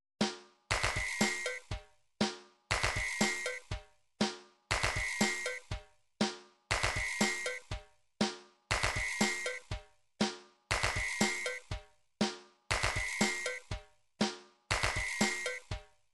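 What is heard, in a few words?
drums pattern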